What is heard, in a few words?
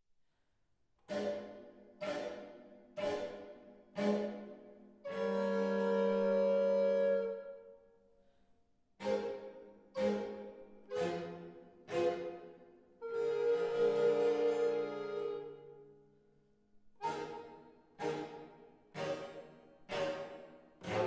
Adagio e staccato; Telemann